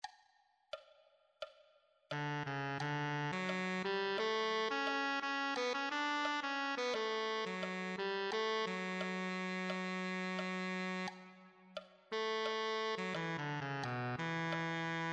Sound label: tenor saxophone